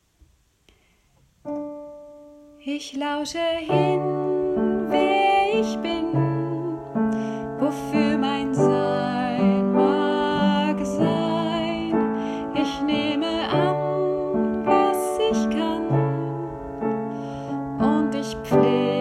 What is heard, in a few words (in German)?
Ich lausche hin Klavier; Workshop „Sing dich frei“